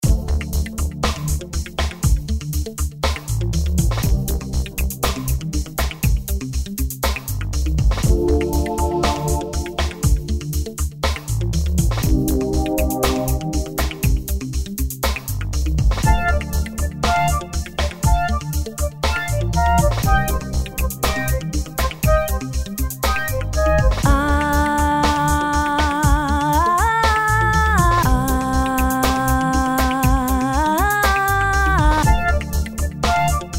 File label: electro